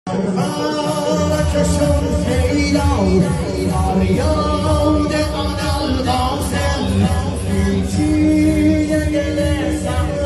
مداحی شور

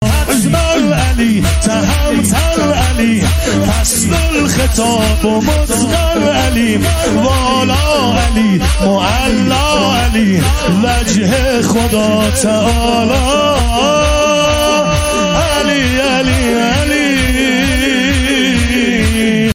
مولودی

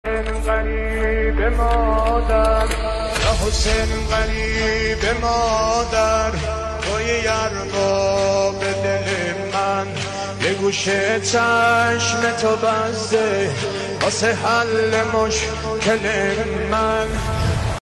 مداحی هوش مصنوعی

